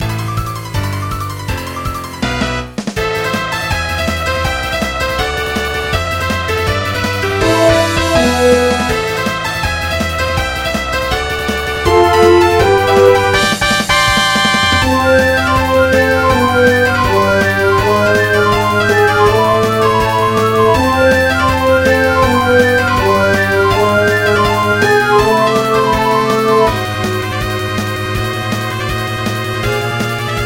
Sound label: Boss Battle